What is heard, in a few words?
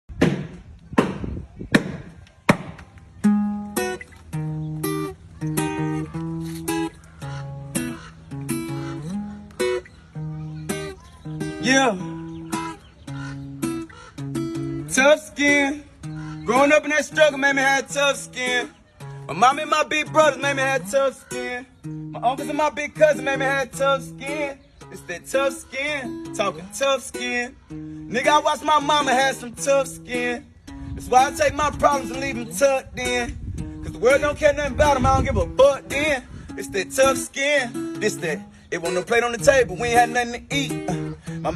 Acoustic